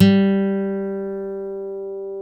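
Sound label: G2 acoustic guitar, 6-STRING; InVision Interactive; Lightware VOL-1; Instruments & Percussions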